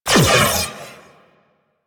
Space Fighter Railgun Fire; Space Fighter Charge Agent; Weapons

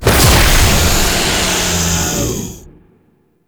Swarm Pickup Tear; Entrati

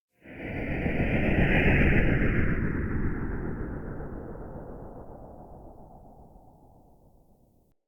Soul Plane random, ambience

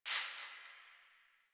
Garbage Plastic Bag Rustle; Warframe; Ambience; Nineteen Ninety Nine

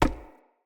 Unarmed Punch Impact Wood Hollow Large; Weapon Impacts; Player; Environment

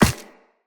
Walk Ice, Footsteps; Operator

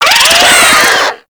Infested Biped Death; Warframe

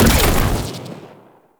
Shotgun Shot Fire; Pax Duviricus

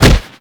Fighting Blocked Impact, Weapons; Warframe

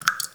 Water Drips; Ambience; Props; Warframe; The New War; Zariman

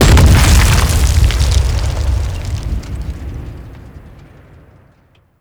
Generic Environment Explosion; Gameplay; Warframe; The New War; Mission Four

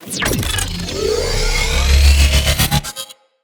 Iron Blast Charge; Warframes

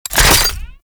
Reload; Warframe; Corpus; Tenet Quanta

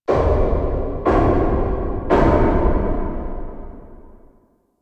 Knock; MITW; Warframe; NineteenNinetyNine; Stage11FuturePast

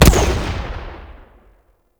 Bolt Imploding; Warframe; Ranger

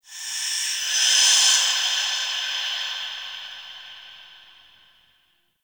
Breaths, Ambience; Warframe; The New War; Zariman

